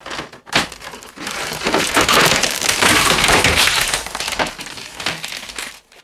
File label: heavy wooden pallets crushing and splintering